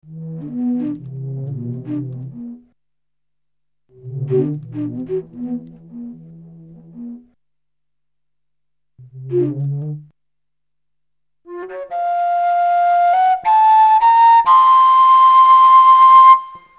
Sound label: flute